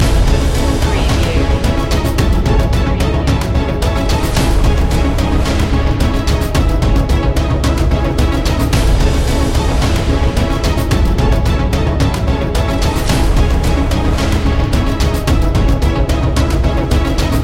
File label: black banner loop